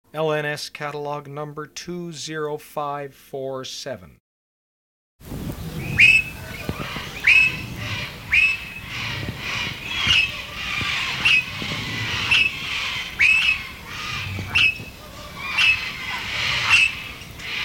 alexandras parrot

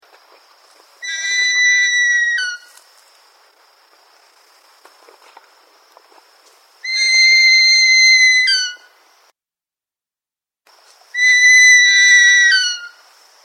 solitary eagle

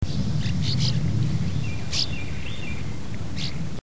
northern rough winged swallow

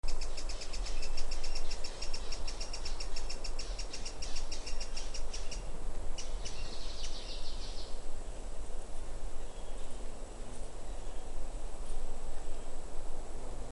azure crowned hummingbird

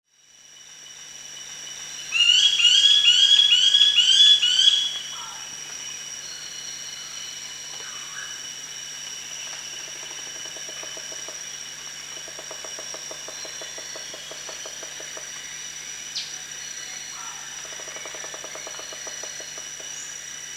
rufous woodpecker

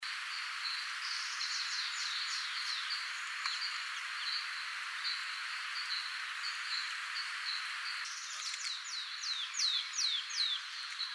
ashy cuckooshrike

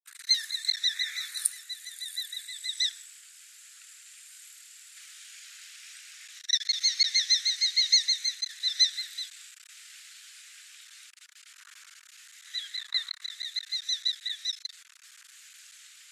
amur falcon

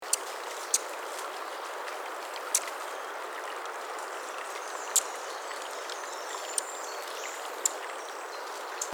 snowy breasted hummingbird

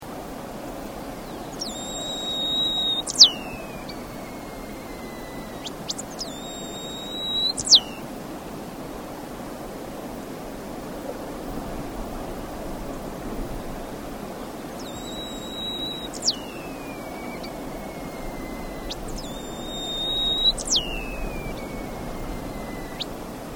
stripe tailed yellow finch

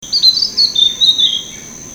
forest robin

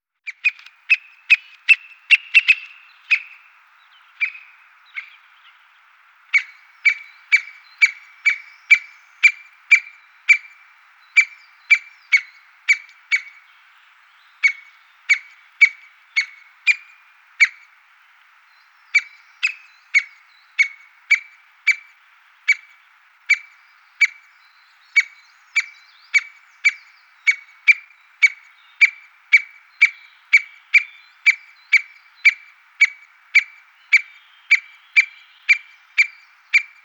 white backed woodpecker

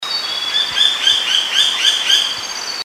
bamboo woodpecker

